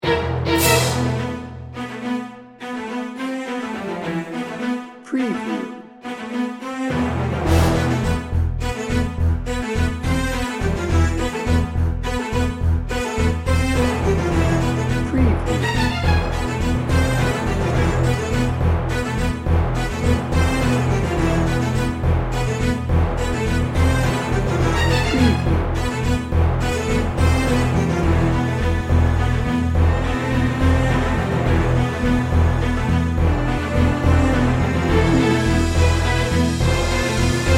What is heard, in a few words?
battle lines no horns no drums